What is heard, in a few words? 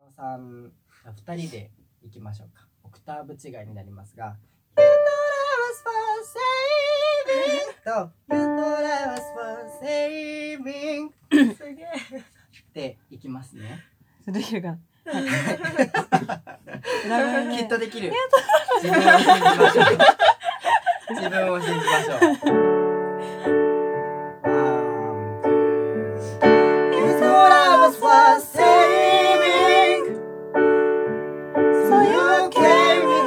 Soprano Men